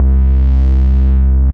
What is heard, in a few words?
Soft Punch, Analog Bass; Ableton; Factory Packs; Retro Synths; Analog Bass Vol 1